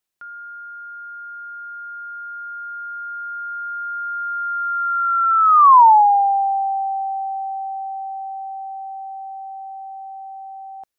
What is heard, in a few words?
Doppler Linear; OpenAL; doppler effect